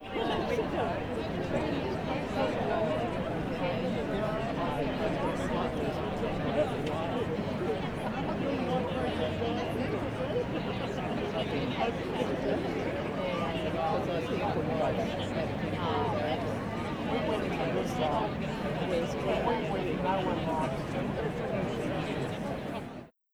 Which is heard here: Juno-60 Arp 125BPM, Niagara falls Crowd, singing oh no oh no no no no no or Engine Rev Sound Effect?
Niagara falls Crowd